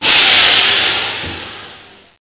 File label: Air Pneumatic